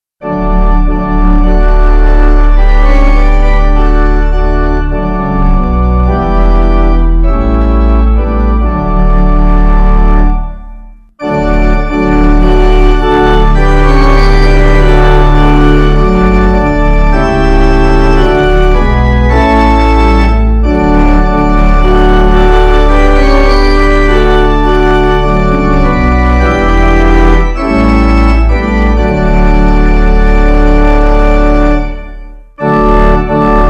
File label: Pipe; Bonner; Praise Him All Ye Little Children